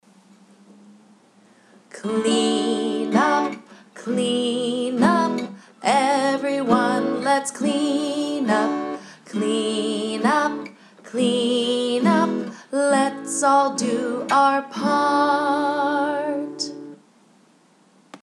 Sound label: clean up